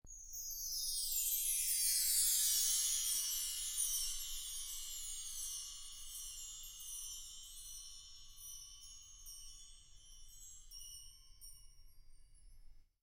Chimes

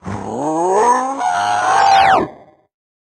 Power Up; Caffiend Caelan

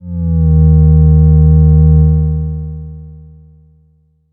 Sfx Finale Beam Up

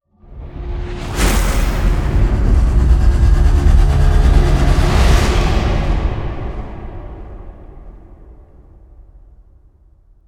Stinger Modulate ramp

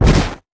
Fall Human; D4DJ Groovy Mix; Android, iOS, Mobile

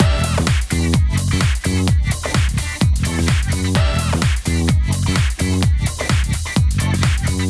boot Sound; Just Dance Kids 2014; Wii, Wii U, Xbox 360

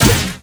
Bounce Ball Hit; disney star smash android ios mobile gamerip 2020

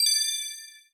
Story Fun; Ui Story Fun1

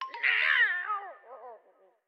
Skeleton Death

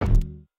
Armor Hit

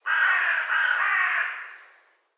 Ambience Menu Crow; Subway Surfers City; Android, iOS

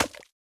Slime Impact Small; Going Under

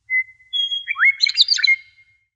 Sfx Environment Meadowlark; Akxolotl

